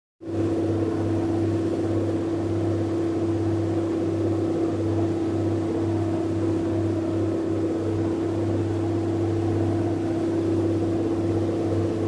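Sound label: Coast Guard Cutter Ship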